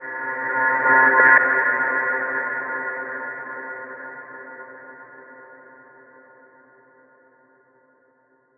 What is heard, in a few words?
C3 Synth; Stealth Mode